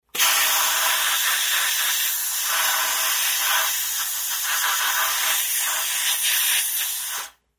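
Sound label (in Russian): promyvanie rta vodoy, rinsing mouth with water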